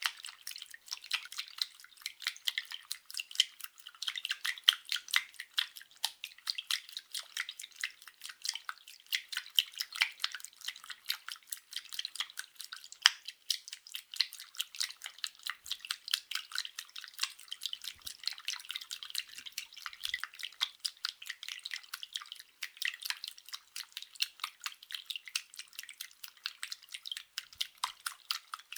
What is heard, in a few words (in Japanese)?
ストローク中～強 ロング, 水音効果音